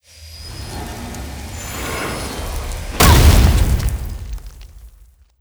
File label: trap earthquake; monster